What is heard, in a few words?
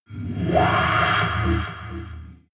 alien metallic scream buzz reverb; aliens